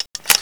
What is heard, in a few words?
Inf Weapons Foley 3rd; Red Orchestra 41-45